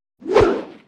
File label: mace, Weapon